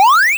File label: powerup